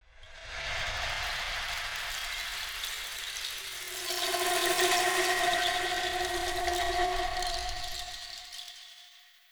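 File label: Woosh Music; From Dust; PS3; Xbox 360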